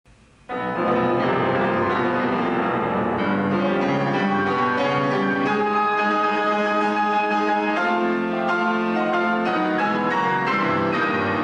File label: toccata for piano